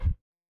Punch Swoosh Thud; Hit Whooshes

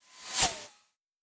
Fly By Saber Low

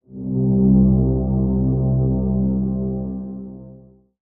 Ambi Drone

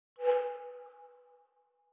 Move Cursor, Sci-Fi

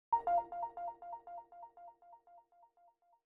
Cursor Selection, Sci Fi

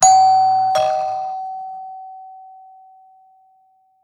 Door Bell; Household

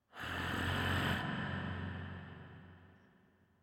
Spooky Breathe Evil; Horror

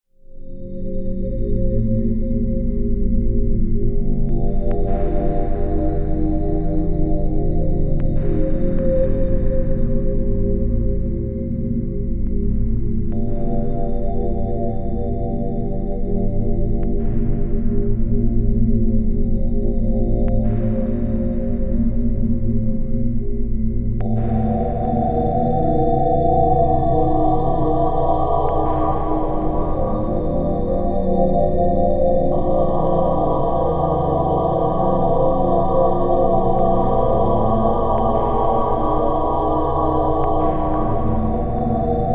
Digital Ambience; Cinematic

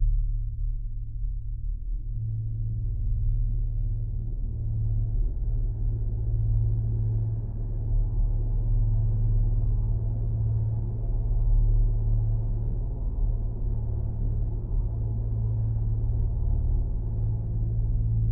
Drone; Drones

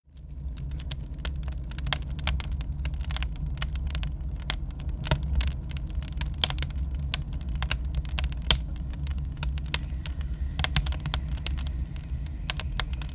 Fire Crackle; Christmas

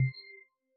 Cursor Click, Sci-Fi

